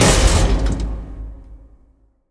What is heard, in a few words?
Gate Slam; Grumble